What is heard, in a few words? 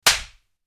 Slap